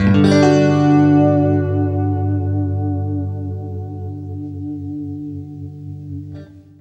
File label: FLESH CHORDS, Guitar